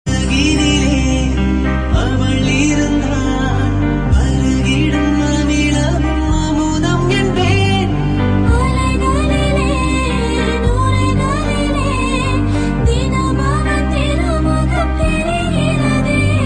Tamil Lyrics

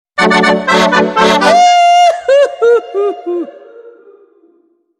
Alpengaudi Sms

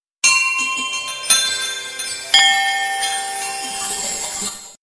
Sms bells

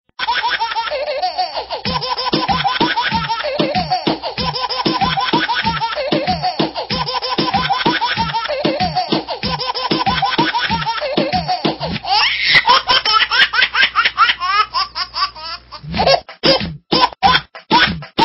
Baby Rap

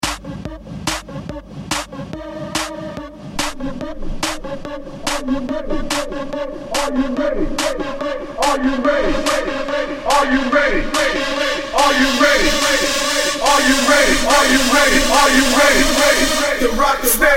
Hardstyle

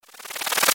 regen; Goldeneye 64

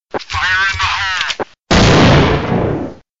Grenade

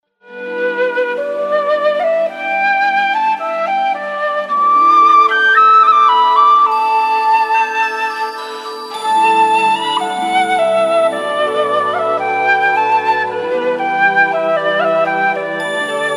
China Ring Tone

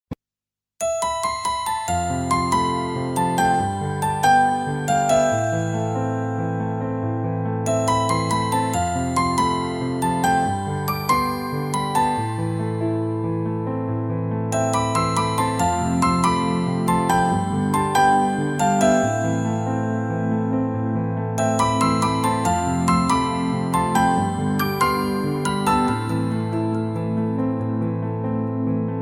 Beautiful Musicbox

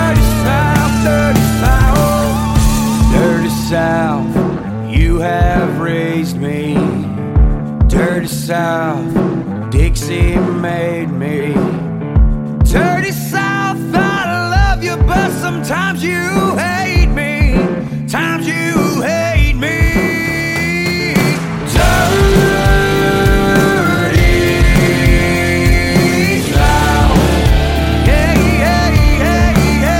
Dirty south

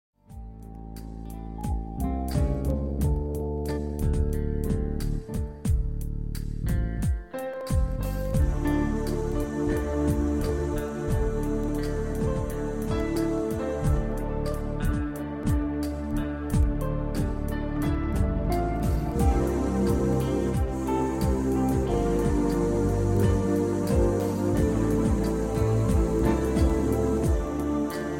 Romantic Piano Tone

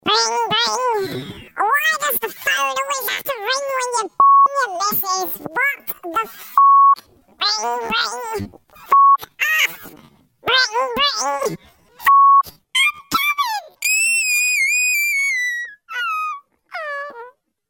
Phone Ring; Epic Fail

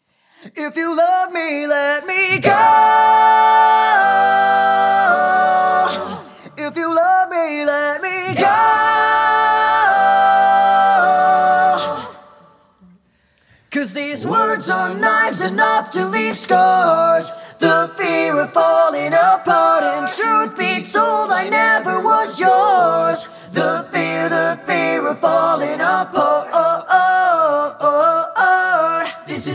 Gospel Acapella

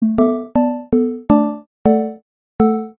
Pad

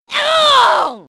voice female ahhuu

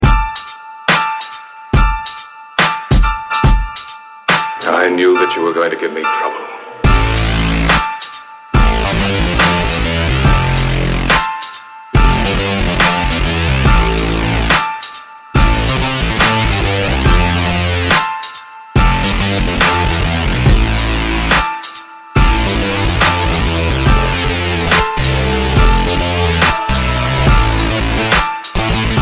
Trouble Bass

